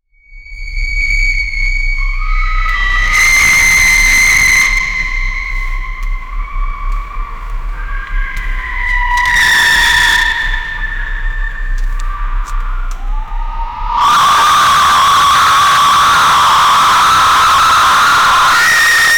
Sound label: scream from the past; history